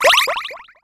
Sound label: wobbling jelly